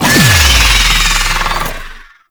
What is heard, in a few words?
Boss Vocal Strike Leap Telegraph; Enemies; Warframe; Oraxia